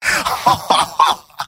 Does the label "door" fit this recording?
No